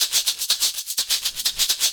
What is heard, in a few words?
Percussion Loops, Shaker & Tamb; Houseworx